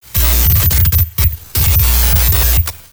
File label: Amp Stutter Glitch Sci Fi; GlitchBlog